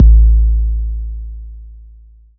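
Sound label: 909 BD long original, kick drum; Goldbaby Tape808 & Tape909; The Tape 909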